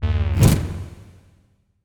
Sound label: open crate false impact sparkles fractured; objcont